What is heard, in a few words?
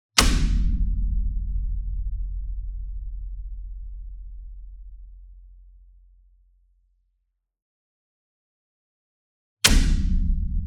Gong Hit Whip Beater Near; SINGLE DRUM; boom library; Cinematic Strikes Construction Kit